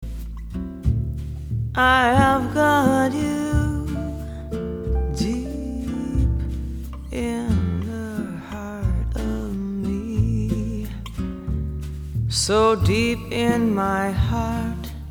smooth jazz chillout; multitrax

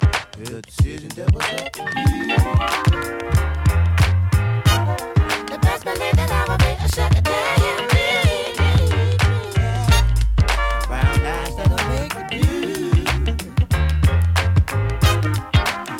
funk; multitrax

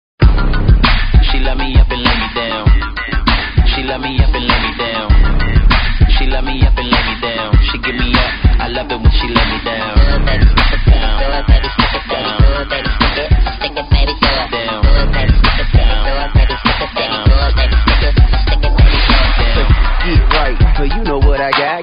funk hiphop

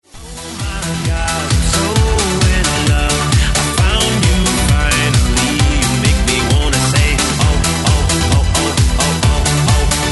funk rnb hits remixed; multitrax

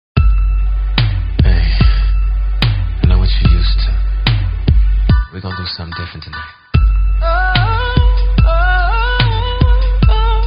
funk; multitrax

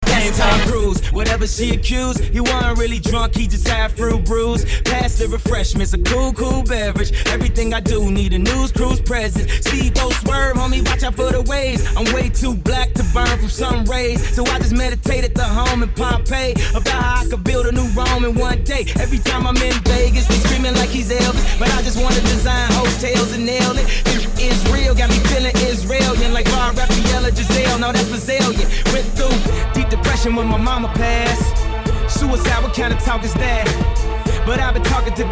funk; multitrax

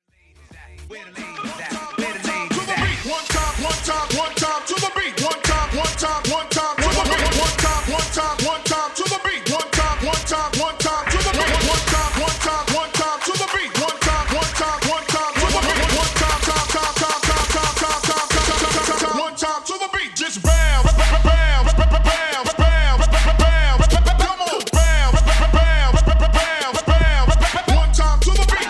funk; multitrax

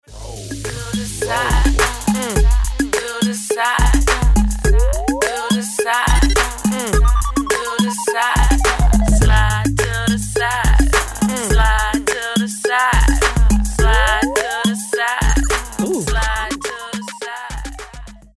funk; multitrax

